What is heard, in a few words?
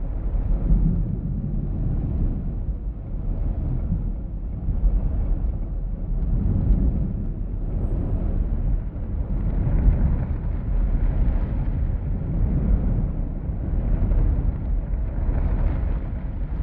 colossus idle; aquatics